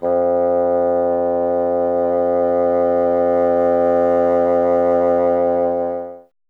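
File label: wind bassoon F; Miroslav Vitous - Solo Instruments VOL-1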